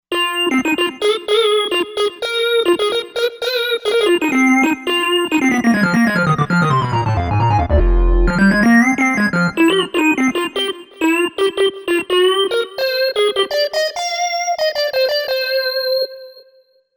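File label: lead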